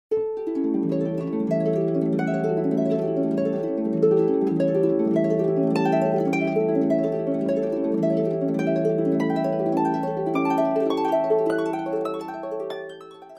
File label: harp